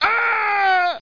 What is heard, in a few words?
ahhh; ARCADE; NOS